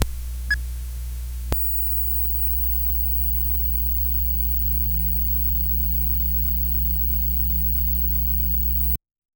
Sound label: a realistic vintage crt m, powering on